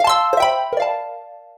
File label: message, telephone; Cosmic Rage; The Experience-light